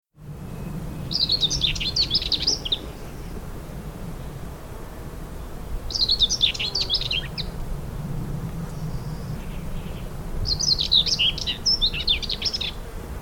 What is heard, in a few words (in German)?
dorngrasmuecke